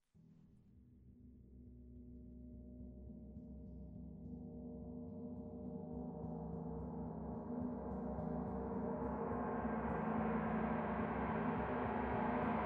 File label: Wind